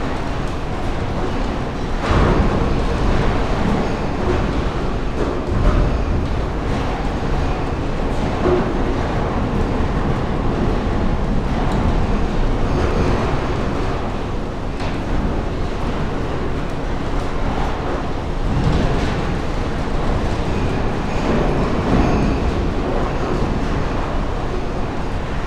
Machines With Clanky Bright Background, Cement Factory; Double Trouble Audio